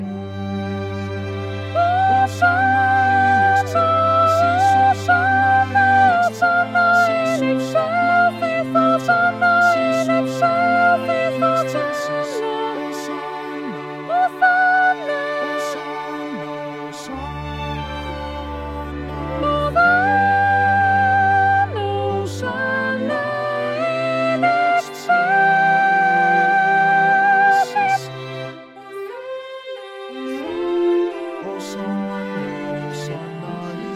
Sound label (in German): osanna sopran